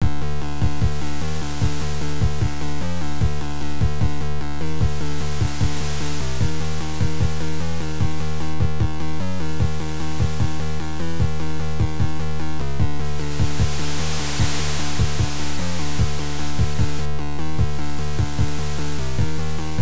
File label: Cave Room; magician; nes